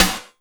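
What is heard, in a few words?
snare drum, drum & bass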